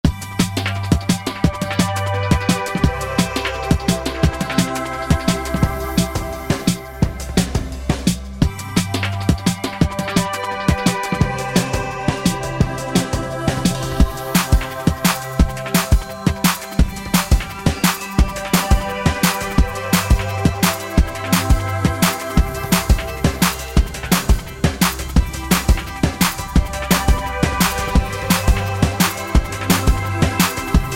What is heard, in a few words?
Drum & Bass; The Sims 4 Get Together; macOS PS4 PS5 Windows Xbox One Xbox Series XS